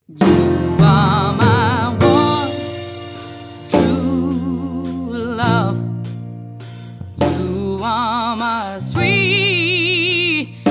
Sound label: gospel